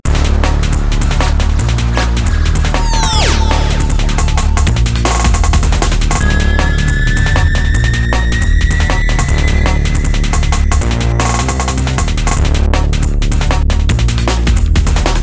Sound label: DnB; Bluezone